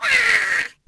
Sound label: spewer death scream